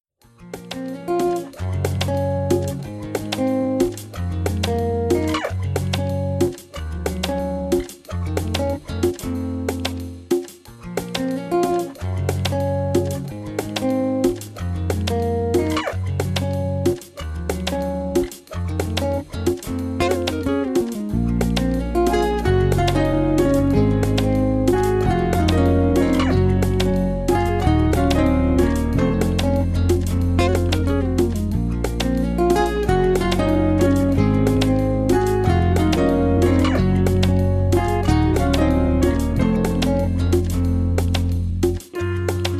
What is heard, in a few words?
vocally 432 hz